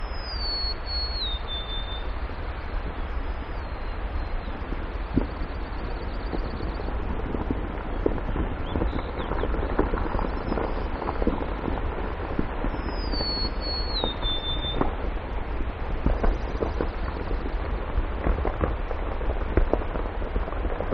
Rockslide With Golden-Crowned Sparrow Song; alaska acoustic highlights; GEOPHONY

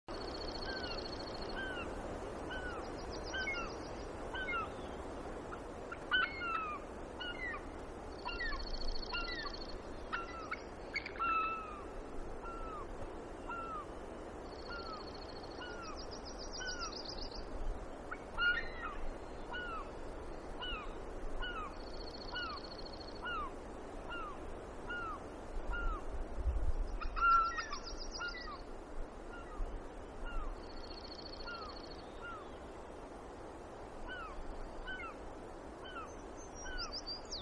Mew Gull With Chorus Background; alaska acoustic highlights; BIRDS

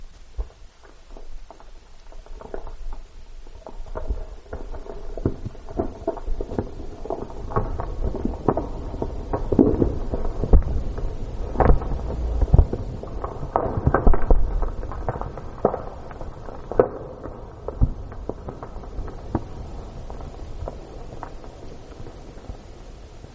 Deep Rock Motion on Glacier; alaska acoustic highlights; GEOPHONY

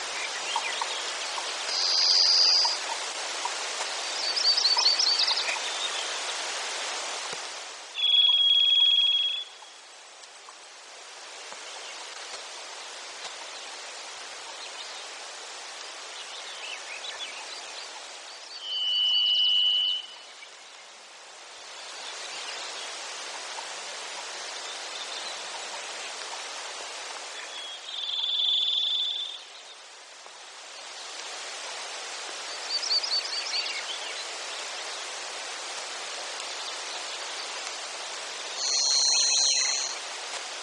Varied Thrush Song Long Sequence

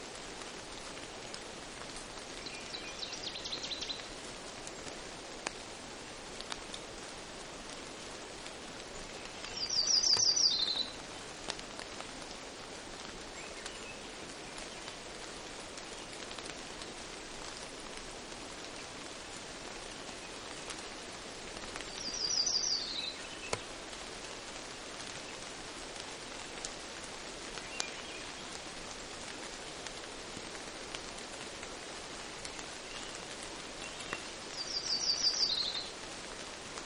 Rain and Punctuated Bird Song; alaska acoustic highlights

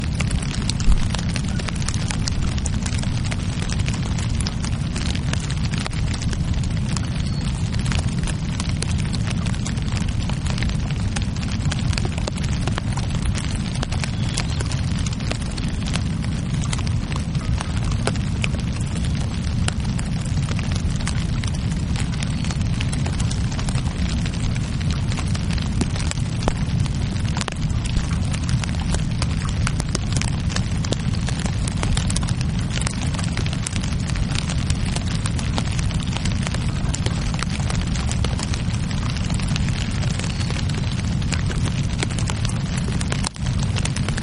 Rain With Faint Bird Chorus; alaska acoustic highlights

